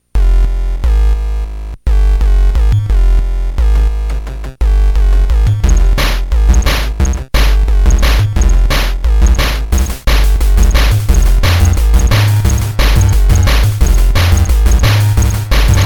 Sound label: wat dnb, gameboy drum n bass; CatchyNameMusic